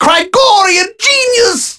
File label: Alarm Clock